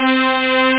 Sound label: string